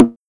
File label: Snare Alt Dry TR909